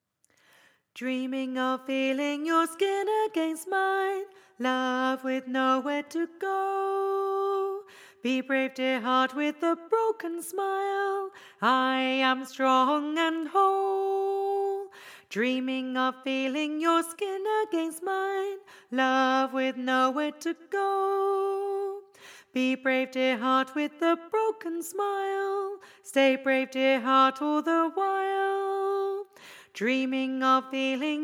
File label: Alto